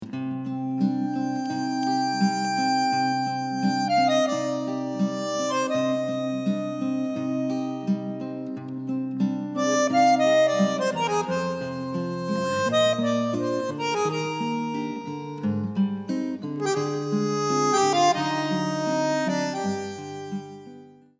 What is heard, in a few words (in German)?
klassisch